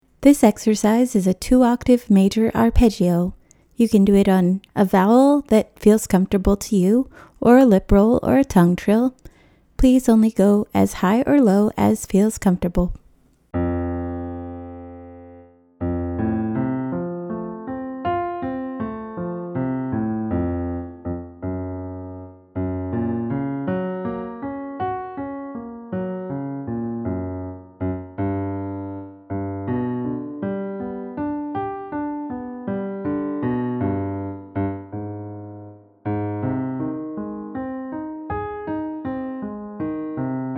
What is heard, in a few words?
2 octave major arpeggio